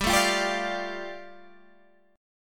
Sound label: G 7th Suspended 2nd, Guitar Standard; strummed; JGuitar